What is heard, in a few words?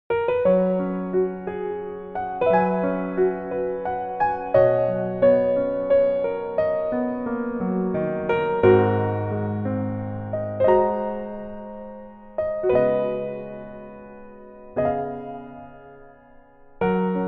Piano ver